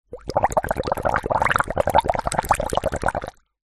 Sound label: food drink bubbles blown through straw into water in plastic cup; zapsplat